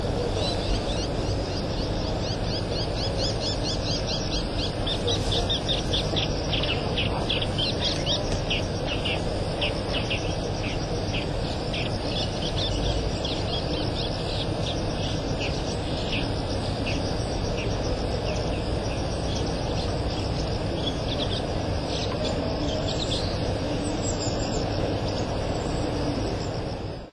bull shrike

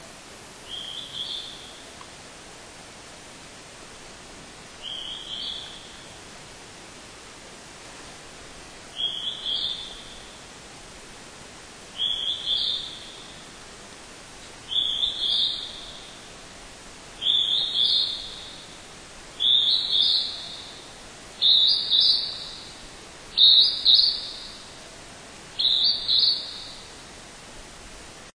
hawk cuckoo

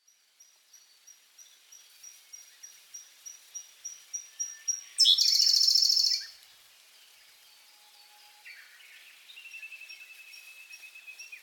siberian blue robin

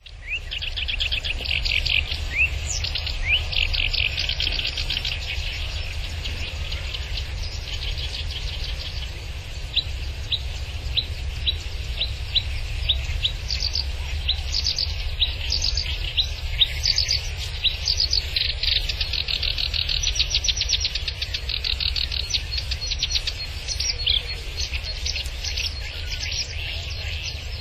black browed reed warbler

